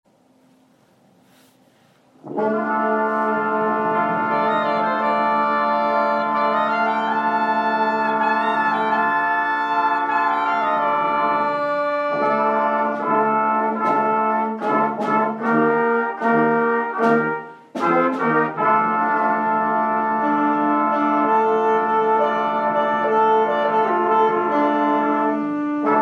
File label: spanish rain